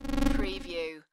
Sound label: Komputer